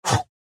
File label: Punch